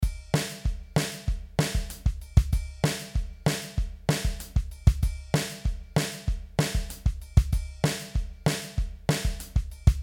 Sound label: Beat